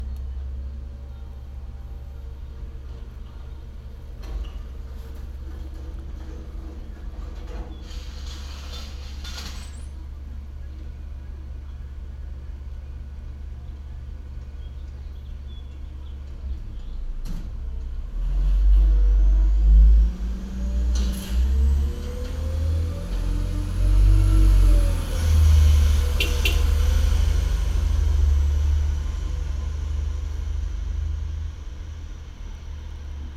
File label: Garbage Truck from Bedroom Window, binaural